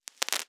Multiple Small Pops

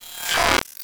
Digital Buzzing Rattle Glitch

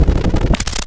Mechanical Malfunction Rumble

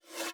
Soft Slide Accelerate

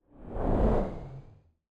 Swoosh Transition

